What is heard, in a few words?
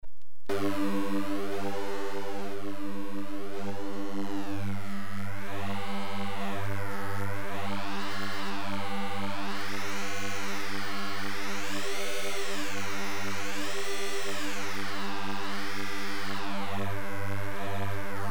waves Sounds